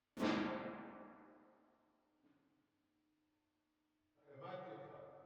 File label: Drums Verb